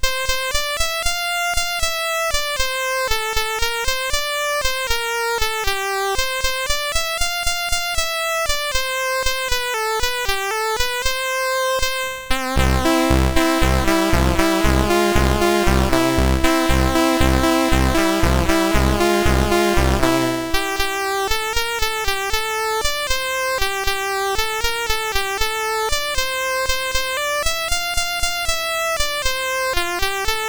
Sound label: mus MOS6581R2; Solo Sergeant Pirates and Police; Pirates of Penzance